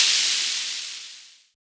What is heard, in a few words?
Drop; osu